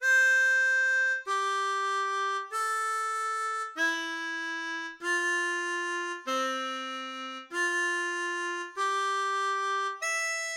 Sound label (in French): Canon De Pachelbel, harmonica